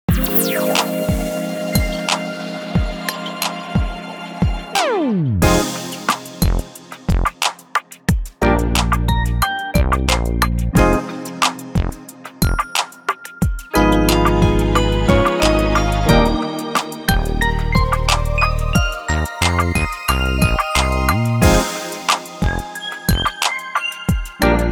Campfire; Born of Bread

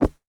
Fall Soft

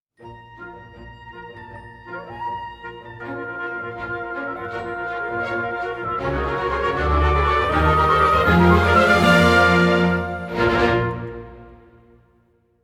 Resonance (Woodwinds Variation); Symphonia Original Soundtrack 2024

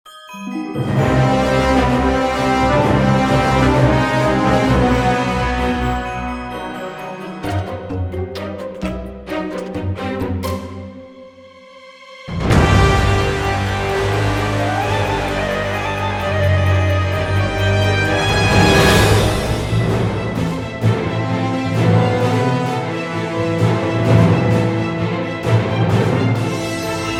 Rocket Flight; Epic Mickey; Wii

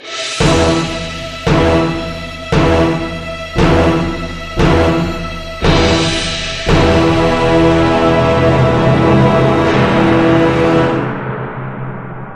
Death Music

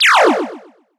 Ingame Score Count Minus; disney star smash android ios mobile gamerip 2020

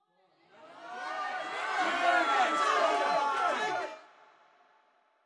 Trial Complete Crowd Cheering Incoming; subway surfers city sound effects; Android, iOS

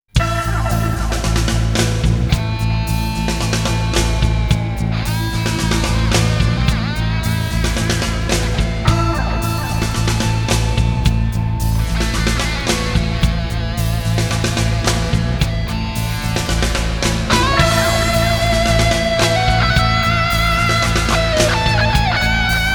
Guitar Version; Rurouni Kenshin Meiji Kenkaku Romantan

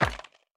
Bounce Furniture

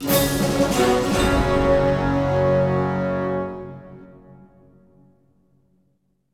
Combat Win; The Hobbit; PS2

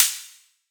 Hat; Exciplex Sample + Presets Pack; JinoBeats

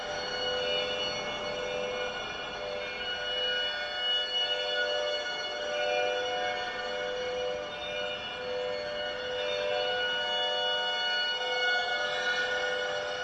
Slow Time Without Heartbeat; Badlands Crew

